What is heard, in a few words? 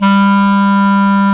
CLAR, Clar Jazz; clarinet; Roland L-CDX-03 Disk 1; Clarinets